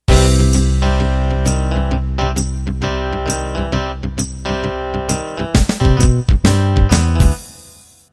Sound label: Country Shuffle Intro